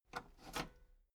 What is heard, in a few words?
metal lever button radio enable slow